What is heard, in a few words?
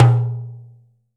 L-TIMBA, TIMBALES